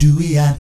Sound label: DOOEEOT, Vocal Jazz Fx